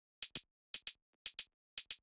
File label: Dholki Beat